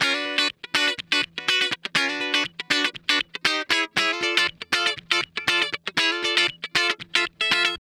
Guitar Licks

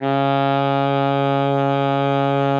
C#3, alto sax; Xsample VOL-2

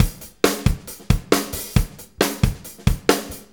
Drum Tools, Loops; Sonic Foundry (Sony Creative Software); Various Loops

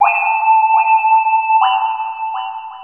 FLUTE, 168 BPM; Ueberschall; Drum & Bass Flash Trackz

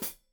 Hat Foot, Hi-Hat, Cymbals Stereo

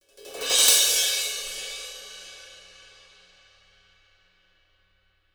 CYMBALS

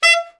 BRA ALT, Alto Sax

